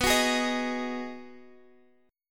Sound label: B 6th B down Guitar Open F; strummed